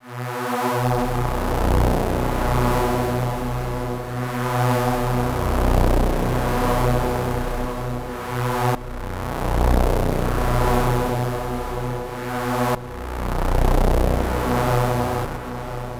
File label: Pad, Trance